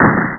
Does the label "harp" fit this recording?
No